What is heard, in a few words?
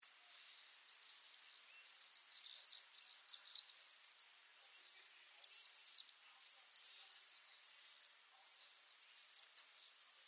Common Swift Apus apus